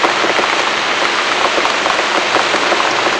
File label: rain